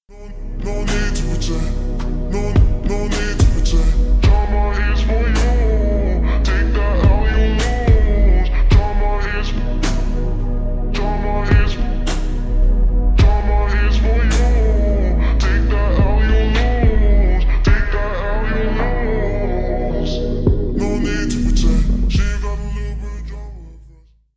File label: slowed reverb